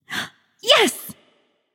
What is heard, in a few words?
yay light; smite; voicepack; hel